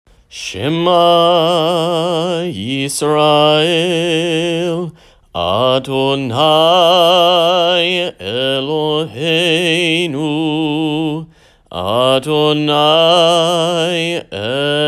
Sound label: Shma Sung